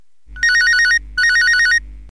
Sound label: Business Tune